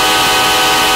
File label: 5 chime horn, Railroader horn; Zaks Horn Pack